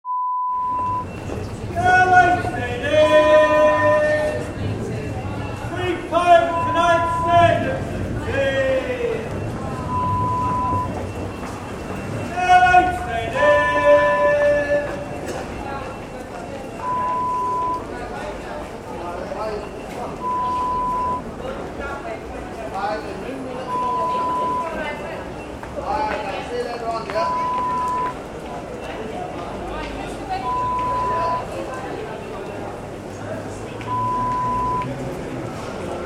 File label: Newspaper Stand